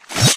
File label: Reverse Stab